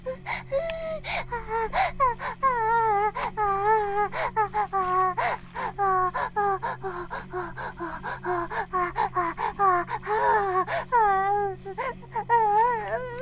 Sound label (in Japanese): バイブ放置; イクたびにわけて